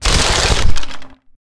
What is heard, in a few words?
linen; World of Tanks Blitz